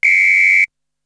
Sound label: Whistle; Drums; ZERO G - Datafile DISC 2